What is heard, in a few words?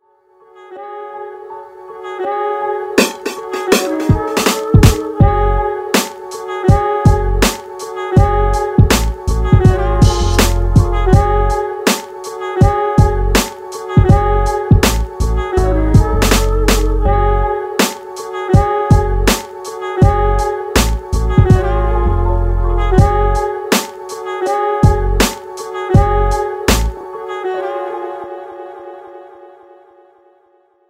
HH BEAT